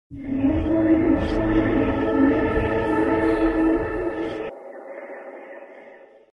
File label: Countdown Sound